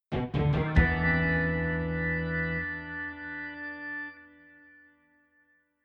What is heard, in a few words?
H-Click